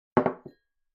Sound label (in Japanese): グラスを置く音